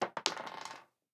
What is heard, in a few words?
die roll, dice